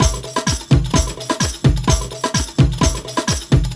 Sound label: Latin House Loop 128 BPM; Vengeance Samples